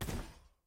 inventory close

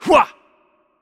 Attack Heavy; VO; bloom minigame

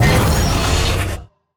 Enemy Attack Heavy Cast; bloom minigame

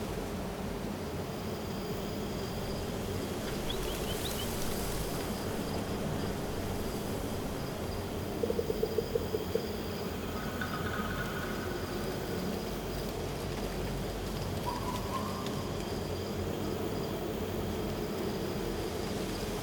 Amb Forest; bloom minigame

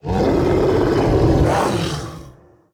Enemy Attack Heavy; VO; bloom minigame

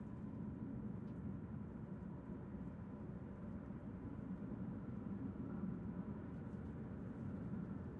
Ambience Lab; Ekkos Lab

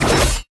Attack Jump; bloom minigame

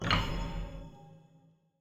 UI event GO; bloom minigame

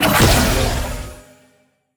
attack jump heavy; bloom minigame

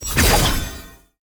Attack Heavy; bloom minigame

